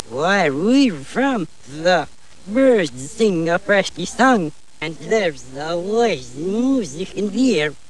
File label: birds sing